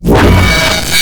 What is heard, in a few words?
hammer slam setup